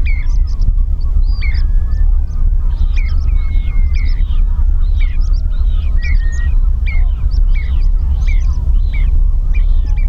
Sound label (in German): Mornellregenpfeifer; trischen